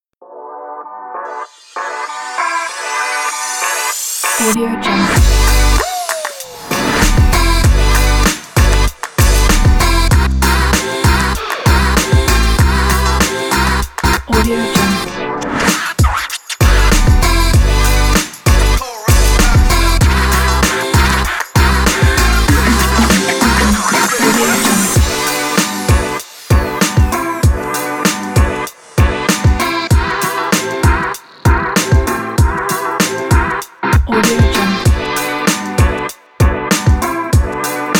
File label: hiphop